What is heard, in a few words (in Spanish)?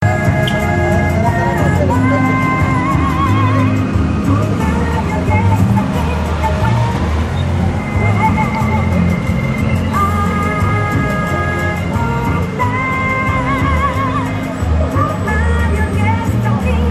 jingle